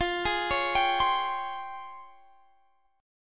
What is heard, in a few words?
Db Major Sharp 11th F